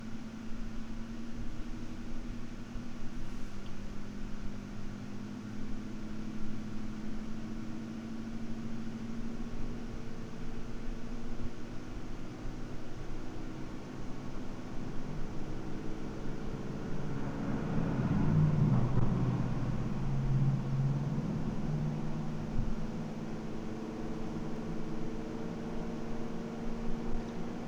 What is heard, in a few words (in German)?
Langzeit-Case-Fan